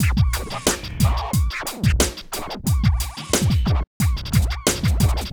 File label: Beat